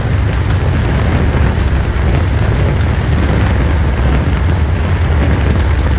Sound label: thermite loop; Weapons; EK modern; Barotrauma